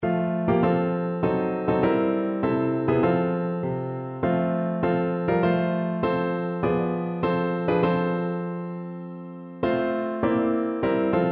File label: weve a story piano